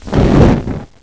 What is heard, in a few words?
fire ignite, molotov; weapons; cs; cstrike; csgo